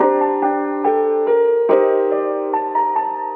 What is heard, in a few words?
piano, Sad